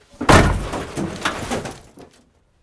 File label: Vehicle Hatches; Red Orchestra Ostfront 41-45